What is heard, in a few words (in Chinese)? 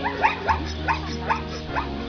banma; animal